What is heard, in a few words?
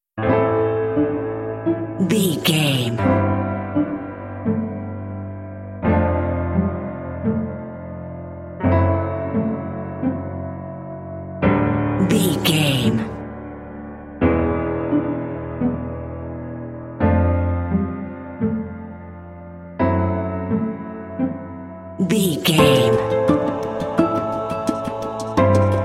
mystery suspense ambience